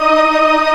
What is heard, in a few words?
Organ, Barton+MortonMel